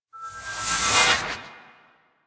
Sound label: Melee Heavy Attack Large Charge; Warframe; Weapons; Whooshes; Heavy Attacks